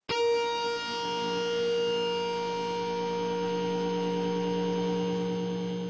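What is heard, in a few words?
heavy harmonic tune up 2nd, Electric Guitar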